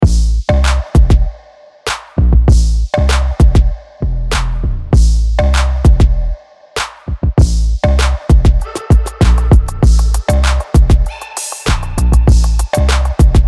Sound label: Basses; Basses Loaded